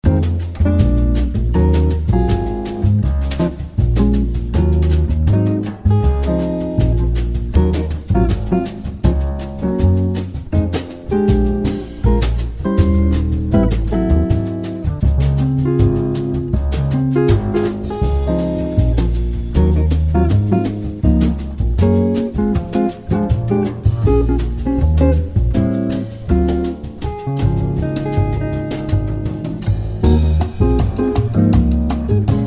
Musica Jazz Instrumental

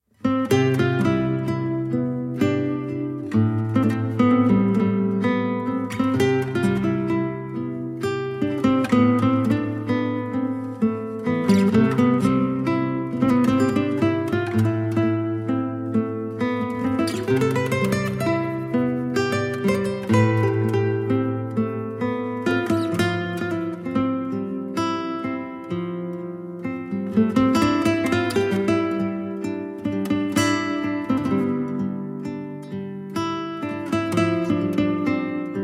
Guitarra

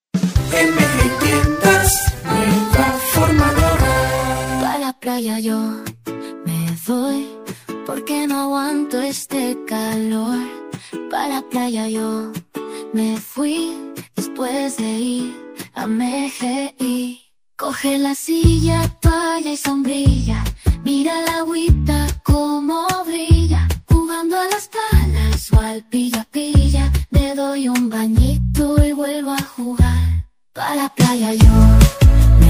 JINGLE Cancion; VERANO 2025

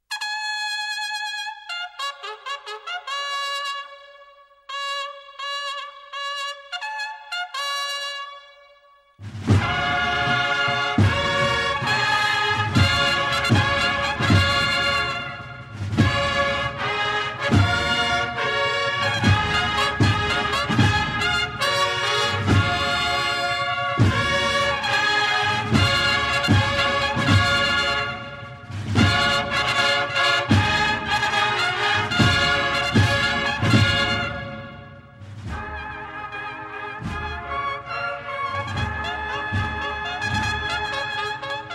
Banda de las Cornetas y Tambores - Marcha Real Proce; Santísimo Cristo de la Exaltación; Marcha Real Procesional; semana santa

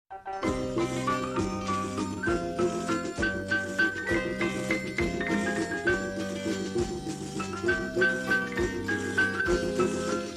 Coro Infantil de Villancicos Populares, villancico popular; Los Campanilleros; Navidad

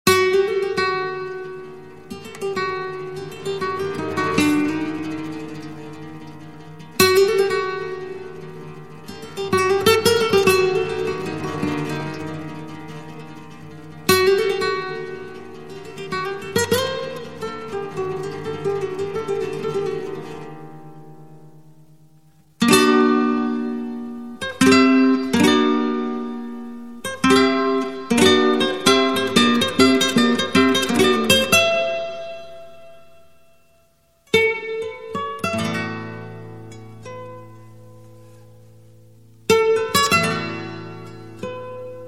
Guitarra Espanola

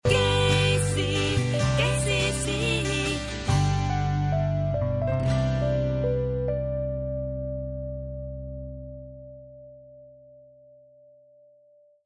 Jingle Corto Sin Voz; SUPERMERCADOS KEISY (Grupo FACSA)

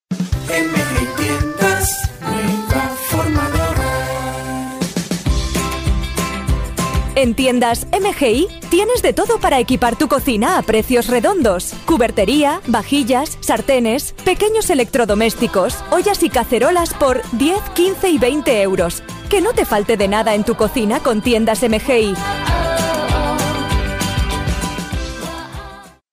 Jingle Cocinas

